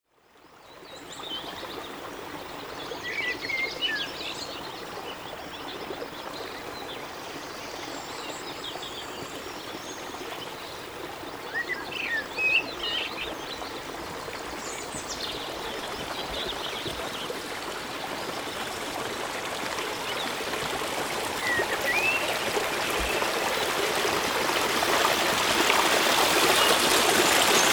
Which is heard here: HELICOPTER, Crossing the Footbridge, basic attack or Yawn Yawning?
Crossing the Footbridge